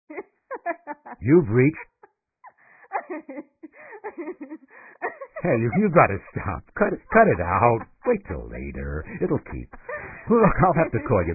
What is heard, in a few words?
Sexy Laugh, Answering Machine Messages